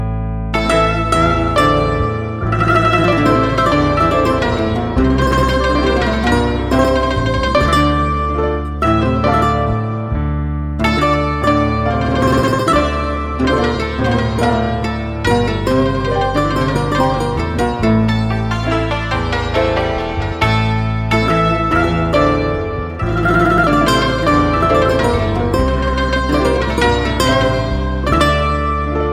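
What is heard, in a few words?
Santur qanun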